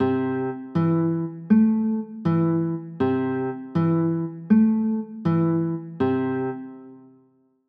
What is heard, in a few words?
c 6, chords